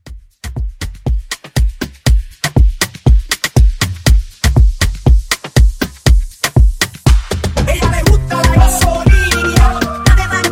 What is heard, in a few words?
Latin Hype Edit 120